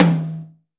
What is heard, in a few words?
Boss DR880 tom; drums